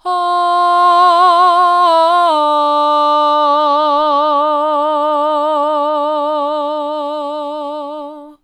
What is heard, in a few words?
ahh hard, vocal